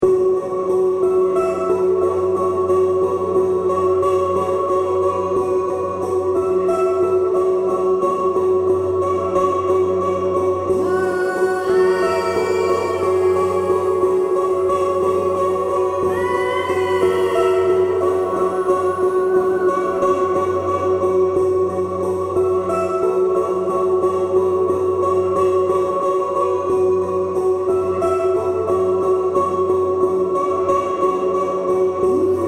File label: Healing Melody